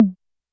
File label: Tom Ana Clean, YAMAHA RM1X